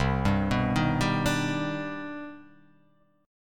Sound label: C Minor Major 9th C